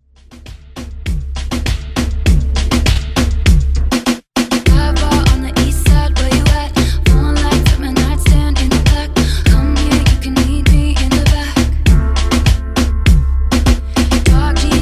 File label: Re Drum 100BPM